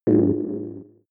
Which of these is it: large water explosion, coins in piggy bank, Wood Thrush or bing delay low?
bing delay low